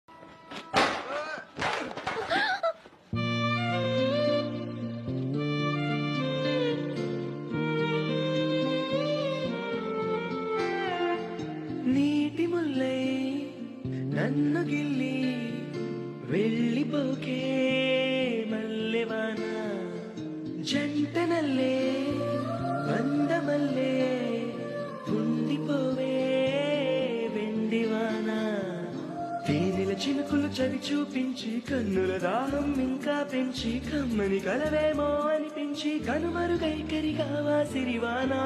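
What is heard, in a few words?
background music ringtones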